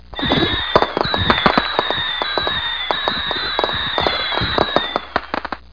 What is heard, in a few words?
firework; Talking E-Mail